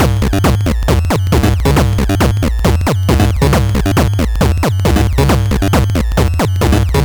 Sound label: LSDJ Wonkin' Loop 136BpM, Nintendo Gameboy Loops; maurice.rebell Loops